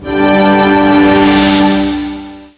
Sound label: Winning; ABC World Reference Wide World of Animals